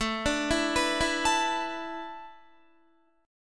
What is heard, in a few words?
A Suspended 2nd Suspended 4th, Guitar; Asus2sus4; arpeggiated